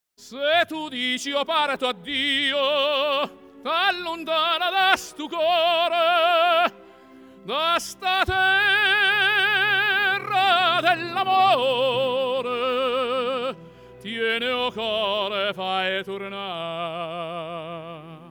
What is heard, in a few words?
Vocal, Tenor opera